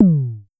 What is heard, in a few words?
Pollard Tom Lo, Syndrum-178